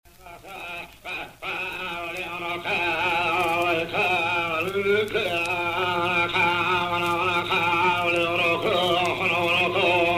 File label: Canto de cura Xeriana; Travassos